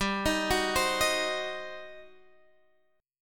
G Major 7th Suspended 4th Sharp 5th, Guitar, Standard; arpeggiated; capo 2; 15,18,16,17,16,x